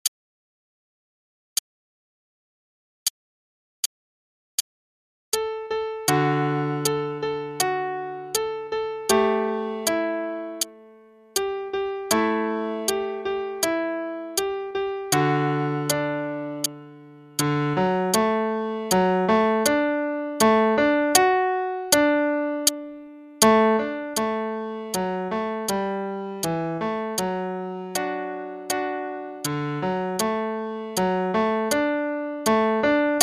Aria (qn=80); Mozart; Faber Adult All-in-One; Adult Book 1 (All-in-One)

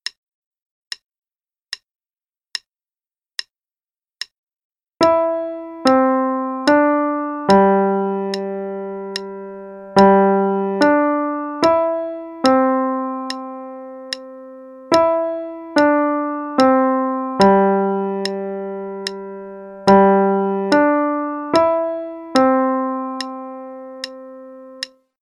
Clock Tower Bells (qn=74); Faber Adult All-in-One; Adult All-in-One Book 1

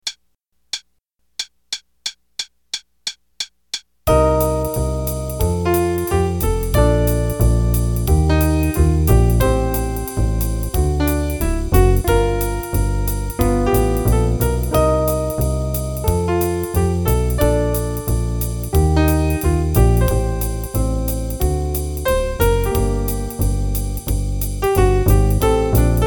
4 choruses qn=180, Jazz Improv Play-a-Long; Jazz Piano

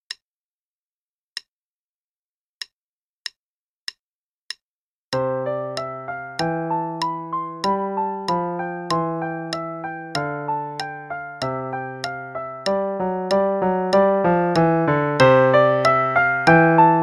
Sonatina in C Major (qn=96); Play-a-long; Latour

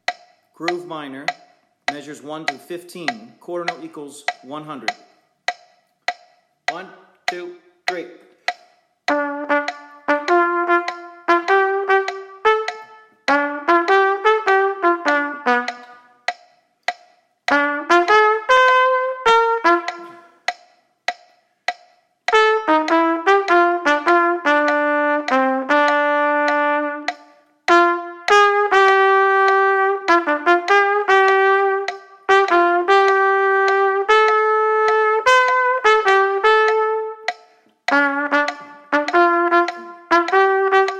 Play-along, Groove Minor, qn=100, trumpet

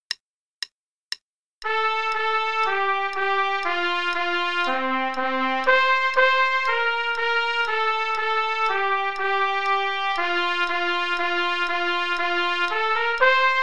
Trumpet Tune (in concert F, trpt only, qn=120); Play-a-long; Belwin

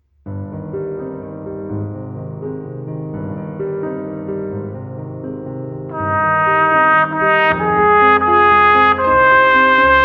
trumpet; Stauss

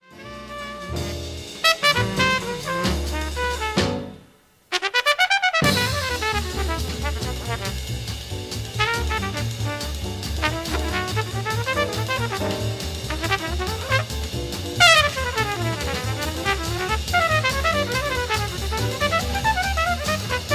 jazz trumpet, solo only

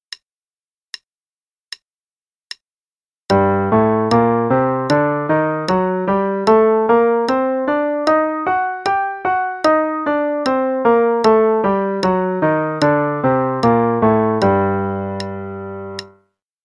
g harmonic minor Scale only (qn=76); 8th notes = 2 octaves; CM Level 4; Daily Warm-ups

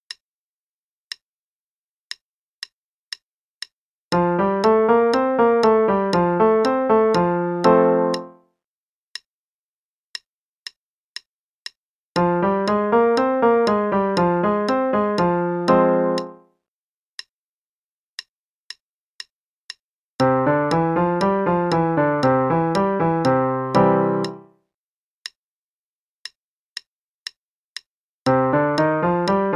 5 Finger Patterns F, C, G, D, A, E (qn=120); Five-Finger Patterns (F, C, G, D, A, E), Major and minor

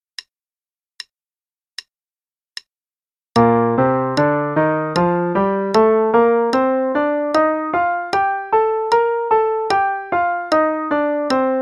Bb Major Scale only; 8th notes = 2 octaves, and triplets = 3 octaves; CM Level 4; Daily Warm-ups

